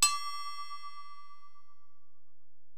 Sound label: Bell, Alesis DM-PRO